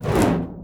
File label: attack fire